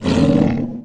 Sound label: sludge; The Elder Scrolls III - Morrowind